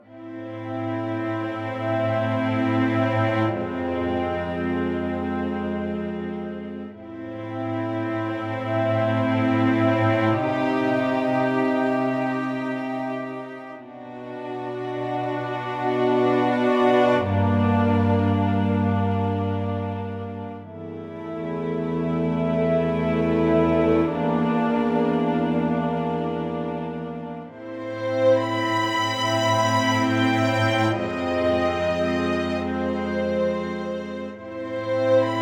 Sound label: bgm event tension